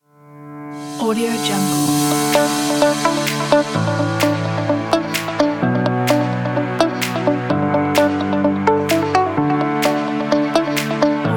corporate drive

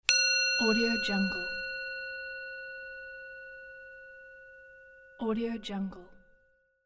tibetan singing bowl

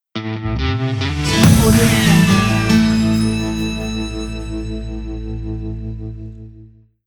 country logo; eliteproductionmusic

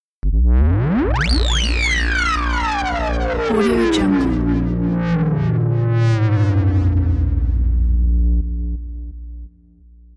crazy downlifter

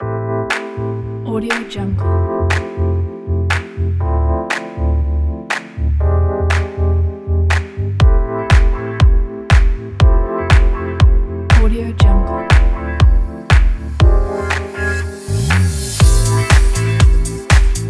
modern lounge chill

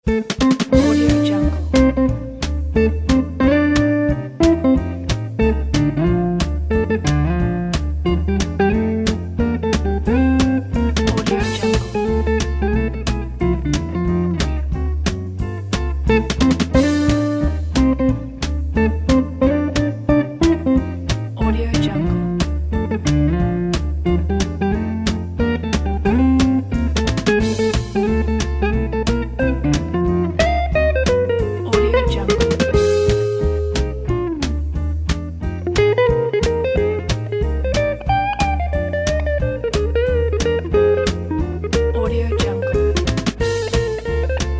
upbeat jazz guitar

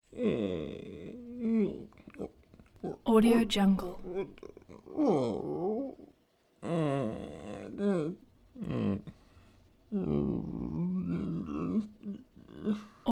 creature voice moans groans sad sorry

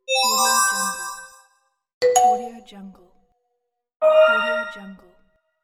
notification

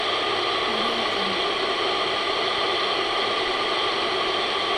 metallic water pipe flow loop

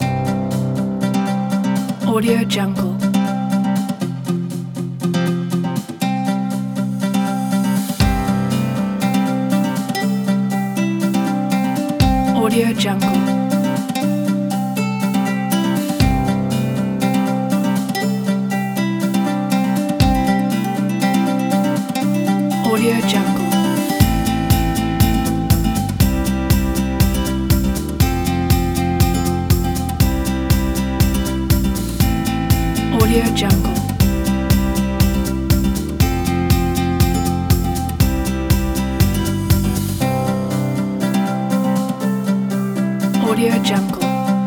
indie folk

